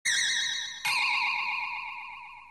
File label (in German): pling effekt; bibi blocksberg